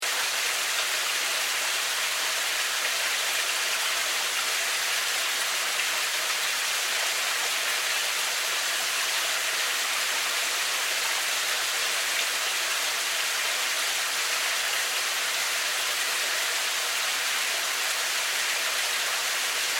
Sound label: Ambience Cave Stream Underground Sewer Loop; Water (Sound Effects:Nature)